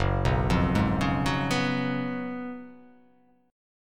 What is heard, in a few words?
Gb Major 7th Suspended 2nd Suspended 4th Gb down, GbM7sus2sus4 chord; GbM7sus2sus4 arpeggiated; JGuitar